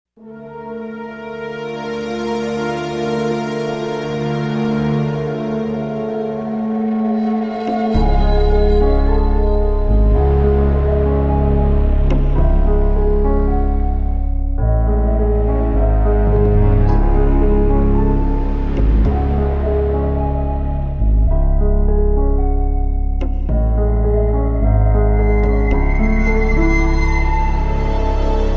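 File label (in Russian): криминальная музыка без слов для фона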